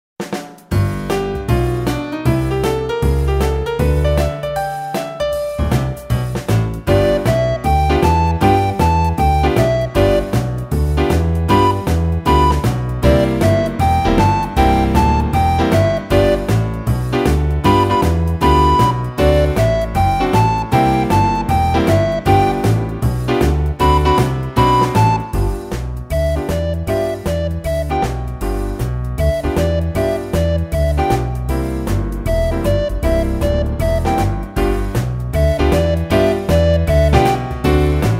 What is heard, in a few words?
Blues Performance